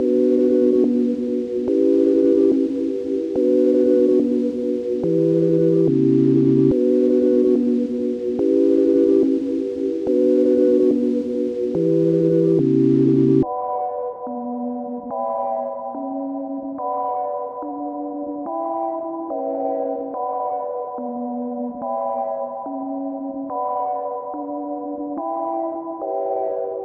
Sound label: 143 BPM B Min Pad; Cymatics; 2022 Melody USB Expansion; Charge